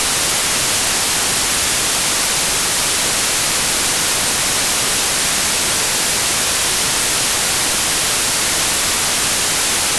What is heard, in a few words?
white noise; 複雑系化学概論